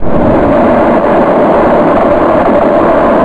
Wounds; M4 7.5.2; M4™ (PowerMac)

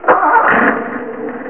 Car Start; Hyper Stacks; Pacific HiTech; Hyper Installer

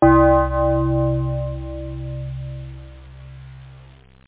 FM tubular; OctaMED SoundStudio V1; jazzies samples

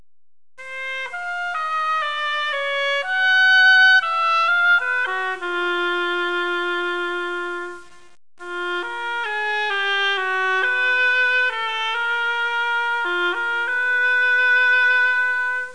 FLUTE; 8BIT

